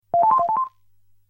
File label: CPU Alert; MMX